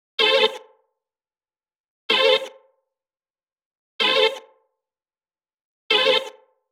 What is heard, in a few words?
Music Loop Looper Am 126